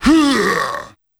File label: Huuaah!